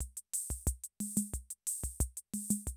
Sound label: CR78, DrumLoops